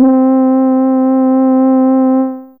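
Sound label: Trumpet High C3, Fairlight CMI IIe; Brass1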